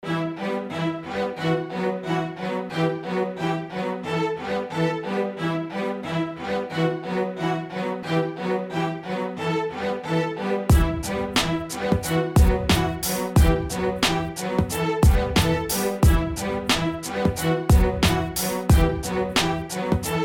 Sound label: Diss Beat